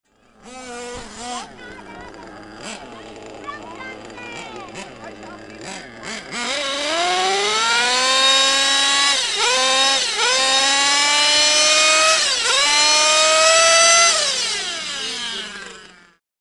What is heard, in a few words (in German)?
rc-auto